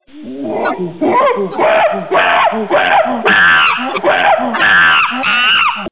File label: crazy ape; animals